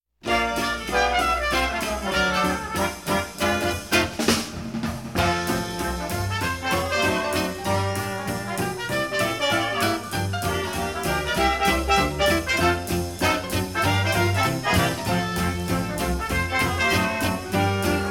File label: Dixieland